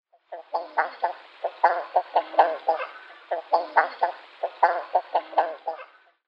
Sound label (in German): Prachteiderente Somateria spectabilis